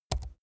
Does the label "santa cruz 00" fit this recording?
No